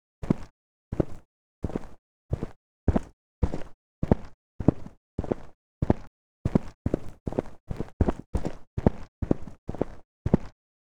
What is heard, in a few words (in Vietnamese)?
Tieng buoc chan di tren nen da va xi mang